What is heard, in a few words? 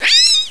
rat die; XDM